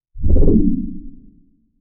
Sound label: typewriter click synth; sound design